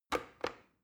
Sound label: plastic button start click regular slow